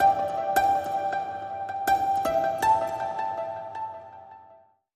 Link Sting

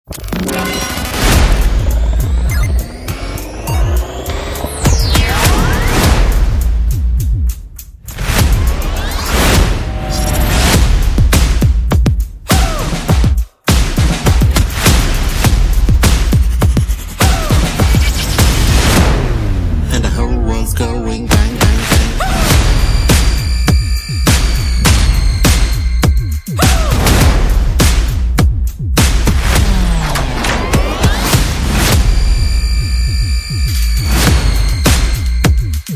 No Lead Synths

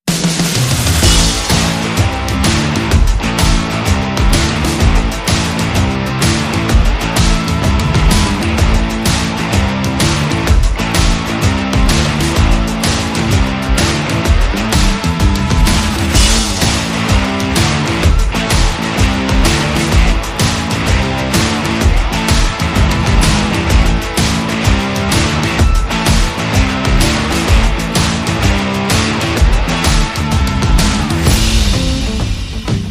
No Guitar Licks

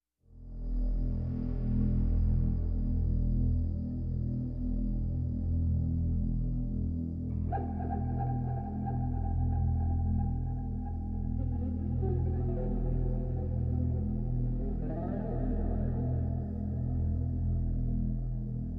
E minor, No Guitar

